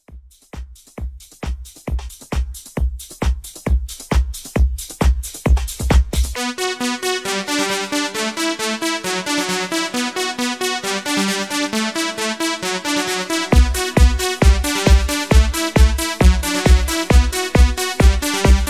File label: 90s Dance Redrum; Remixfreakz